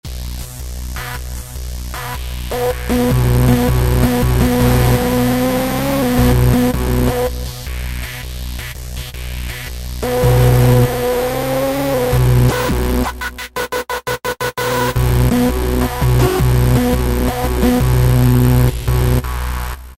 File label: Rave Station; Roland SR-JV80-11 Techno Collection